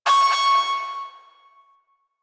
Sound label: Fortress Klaxon Alarm Finished; Ambience; Warframe; Grineer Fortress